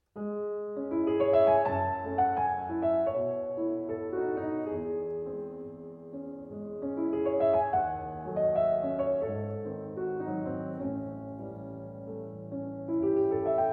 Improvisation; 8 Pièces brèves, Op. 84

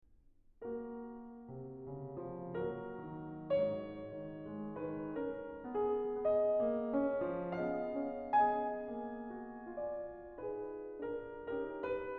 Preludes, in G-Flat Major, Lento

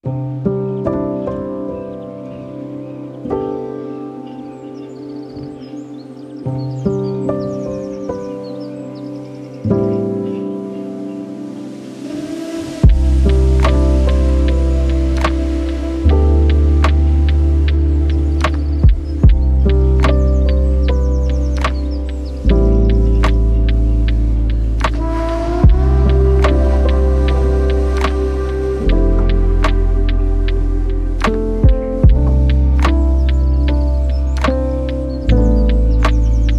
lofi piano

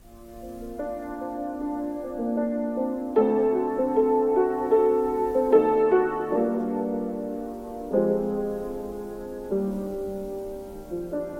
lofi piano

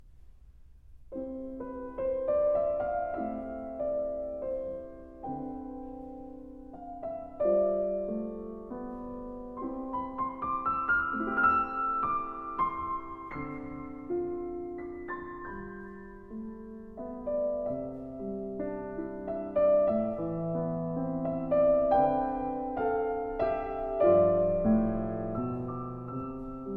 Miniatures for Piano, Grazioso; 33 Miniatures for Piano

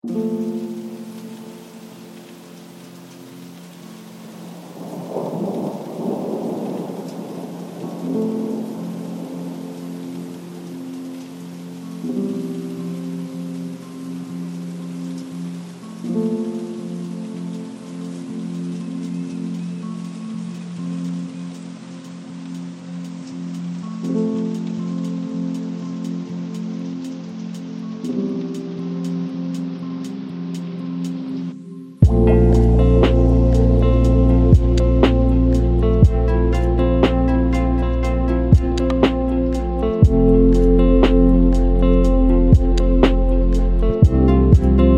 Pouring Rain, Lo-Fi

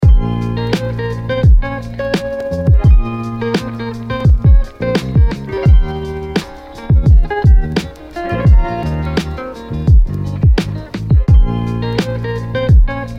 lofi beats

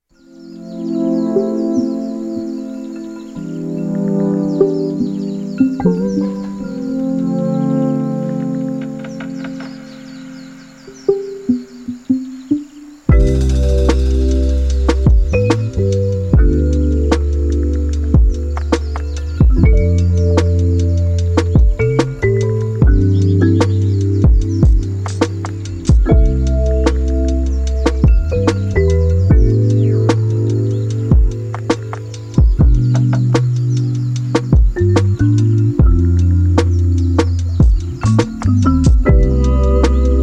lofi beats

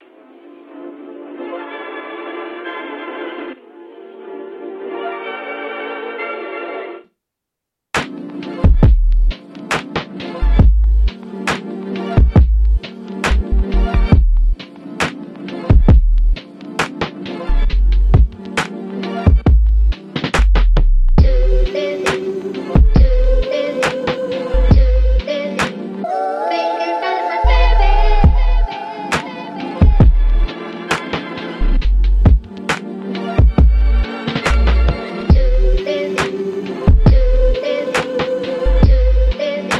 Lofi Flip

